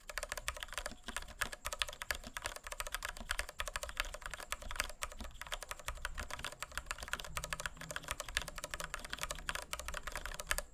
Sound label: cherry nixie; mechanical keyboards